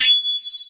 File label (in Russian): zvon zheleznogo mecha